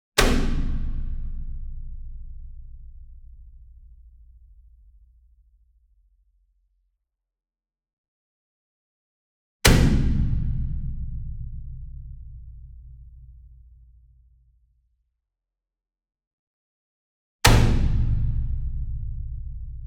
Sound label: Gong Hit Whip Beater Medium; SINGLE DRUM; boom library; Cinematic Strikes Construction Kit